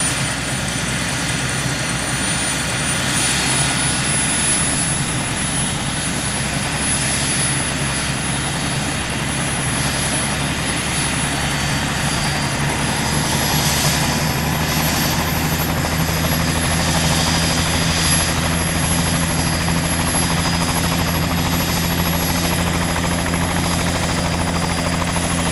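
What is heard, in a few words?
Heli Takeoff Mic Bump, bell 212 helicopter; Helicopter Takeoff, Mic Bumps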